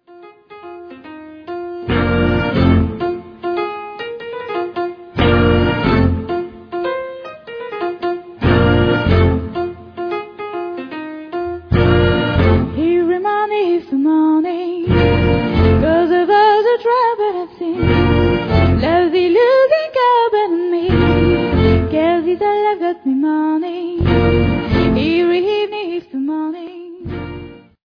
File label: Moanin